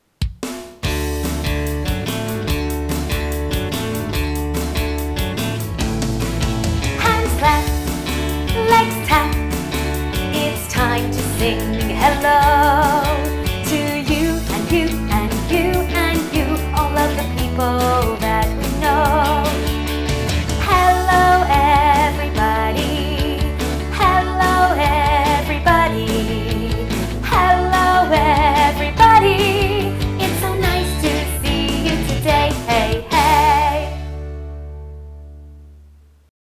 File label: Time to say hello vocal short